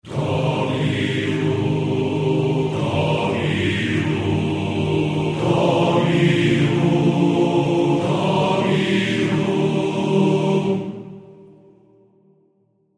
Church Choir Singing Dominum, religious, 91, D sharp; acapella